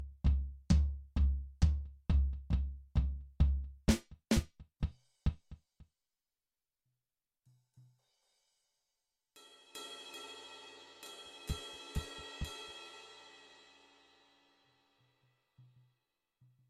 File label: DRUM